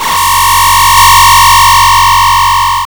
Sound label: death; game-off-2016